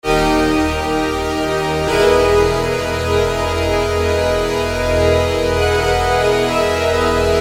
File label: Granular Saw; sickrate; siik essentials iv